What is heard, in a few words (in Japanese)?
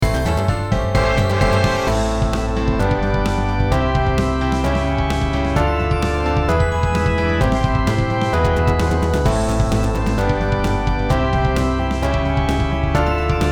戦闘曲・バトル曲; FFボス戦風